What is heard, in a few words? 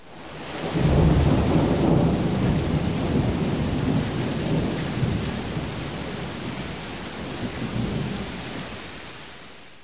Rain